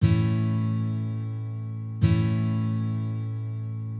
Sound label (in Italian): accordo la